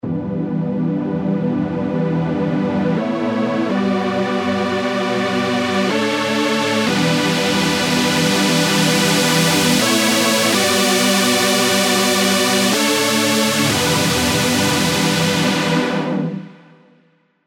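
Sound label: Lead Epic Saws; SERUM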